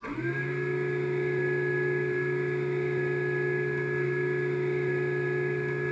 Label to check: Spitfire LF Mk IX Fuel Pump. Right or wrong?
Right